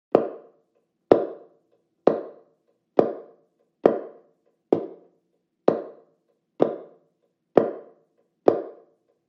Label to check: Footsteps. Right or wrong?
Right